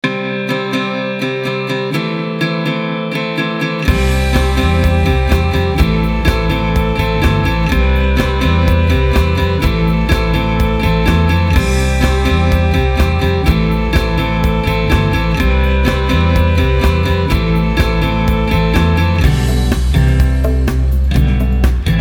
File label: Acoustic Pop; Cues for Film and TV